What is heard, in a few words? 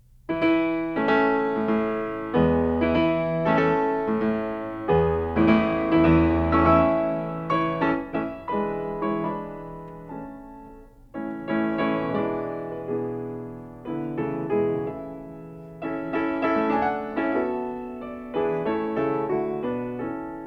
Song Without Words for piano in A major